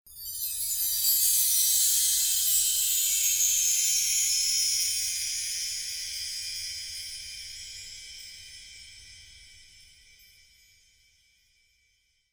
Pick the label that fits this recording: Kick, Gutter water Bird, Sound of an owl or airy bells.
airy bells